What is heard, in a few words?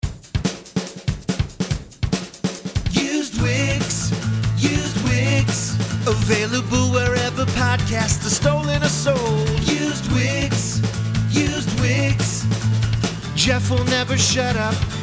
jingle